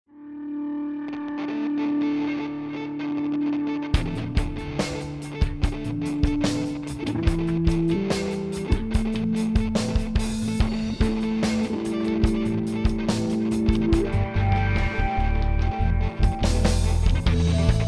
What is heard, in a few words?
Ambient Dub Electronic